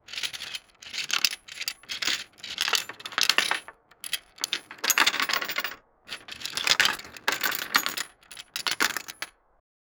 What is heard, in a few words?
sound of multiple small m, board game; sound effect